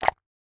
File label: click button